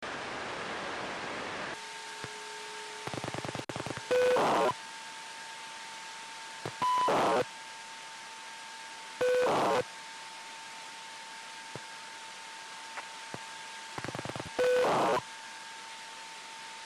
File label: 267.035MHz; 267.035 MHz in LSB; Pennsylvania; Yupiteru MVT-7100